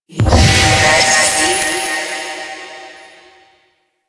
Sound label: Puzzle Hand Dissolve; Warframe; Duviri; Bombastine Encounter